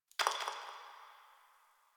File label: Brick Crumble